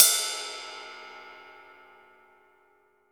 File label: long ride single hit D key, Royality free ride tuned to the D note. Loudest frequency: 7774Hz